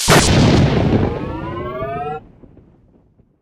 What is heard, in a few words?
gauss shoot, weapons